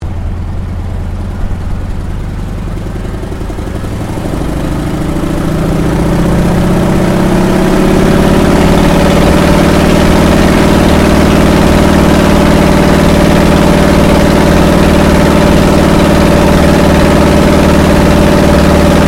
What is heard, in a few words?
AIRCRAFT CAC WIRRAWAY TAKEOFF INTERIOR STEREO; CA-16 Wirraway; Airplanes